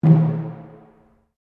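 Drum Taiko Cylinder Rope mezzo forte, Japanese cylinder taiko; Miscellaneous Musical Sound Effects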